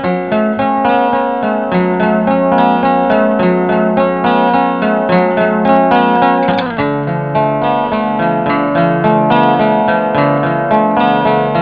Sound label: out treble; Digital Audio FX